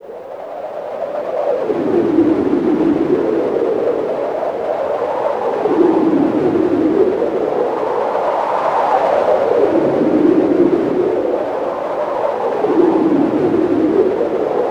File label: atmo, atmosph, special fx twisted